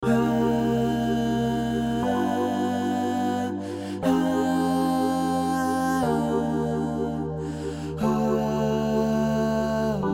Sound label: Rough vocal with VariAudio correction